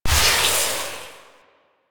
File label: Shotgun Hit Fx Stinger; Weapons; Warframe; Pax Duviricus